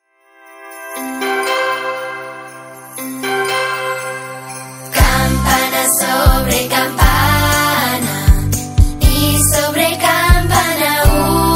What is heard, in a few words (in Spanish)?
villancicos campana sobre campana; los villancicos